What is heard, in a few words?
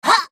effort; Fizz